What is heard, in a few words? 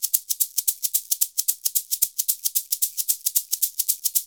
SHK, Shaker; Univers Sons; Basicussions